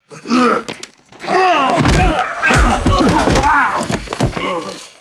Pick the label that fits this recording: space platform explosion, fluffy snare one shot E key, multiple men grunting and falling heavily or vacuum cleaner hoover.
multiple men grunting and falling heavily